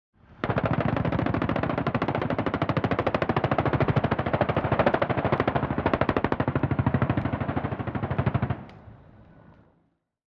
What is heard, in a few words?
AH1 Hover Mach Fires, ah-1-cobra